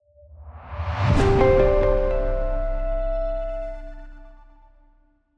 Cinematic Logos Drum Impact and Keyboard Welcoming Melody